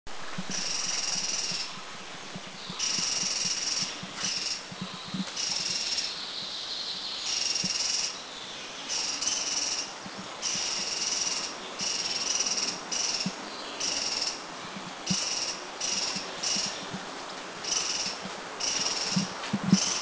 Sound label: Slaty-bellied Tesia Call; Thailand